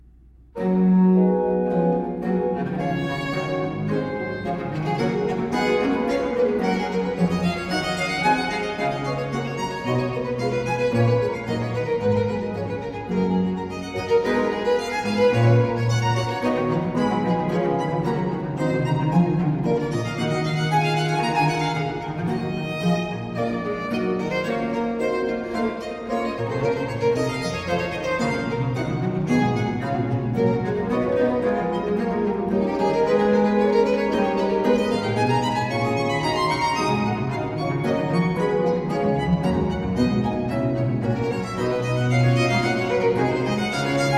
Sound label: Presto Sonata For Violin and Continuo In G Major; BMV 1021; Johann Sebastian Bach; JS Bach Violin Sonatas